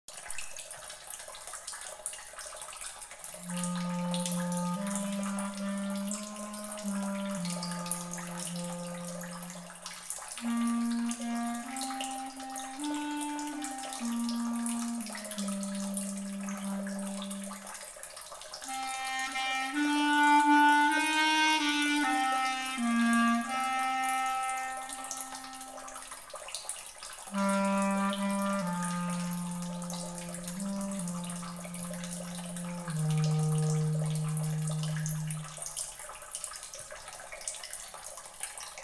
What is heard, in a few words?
Clarinet